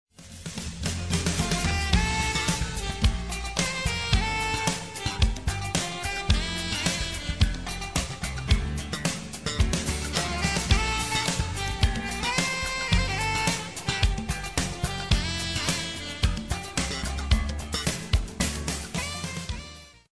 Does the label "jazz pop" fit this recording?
Yes